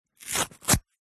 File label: foley card toilet roll rip small section